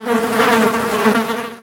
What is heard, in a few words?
fly, outdoor ambient